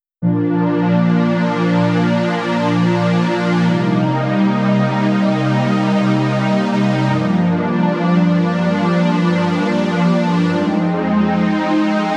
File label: pad 70